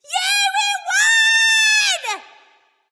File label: yay; smite; jingwei